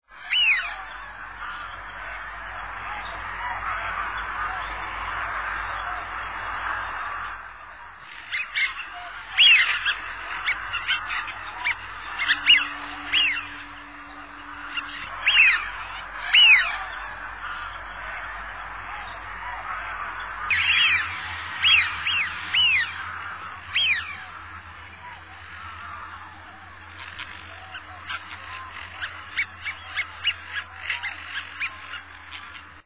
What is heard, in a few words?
Anas penelope; voice